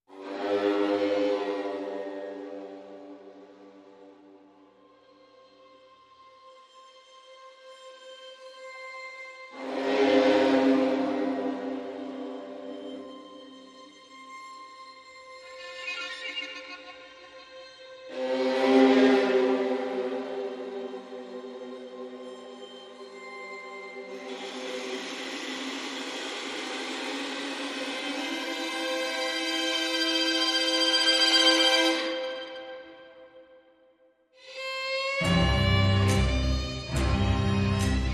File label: Strings Only